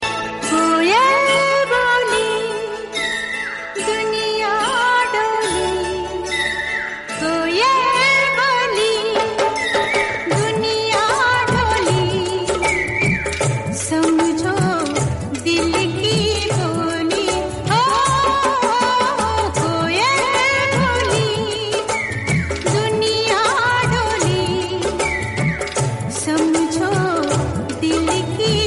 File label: sargam ringtone